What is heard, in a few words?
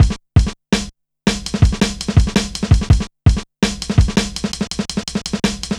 Persistent, Jungle, Drum Loops 165.5; Zero-G Jungle Warfare 1, 2 & 3